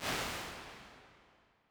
Small Church, Lexicon 480L Reverb; impulse responses; Big Gee's

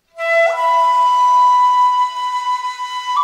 real Shakuhachi Flute

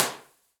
Tavern, Impulse Response; Hartwell; EchoThief Impulse Response Library

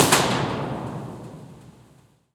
Battery Brannan, Impulse Response; EchoThief Impulse Response Library

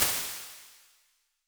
Thin Plate, Lexicon 480L Reverb impulse response; Big Gee's

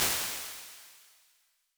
A Plate, Lexicon 480L Reverb impulse response; Big Gee's

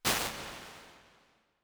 Very Large Ambience, Lexicon 480L Reverb; impulse responses; Big Gee's

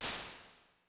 Horn Plate, Lexicon 480L Reverb; impulse responses; Big Gee's

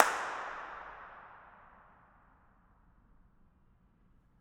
stairway, Impulse Responses, Reverbs; BU Reverbs

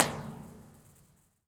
Walkway Under E Campus Dr, Impulse Response; EchoThief Impulse Response Library

